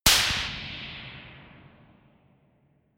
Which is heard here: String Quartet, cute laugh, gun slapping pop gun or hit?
gun slapping pop gun